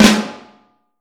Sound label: Vintage Snare Drum; 3.5X14; Q Up Arts; Johnny.C's Vintage Snare Drum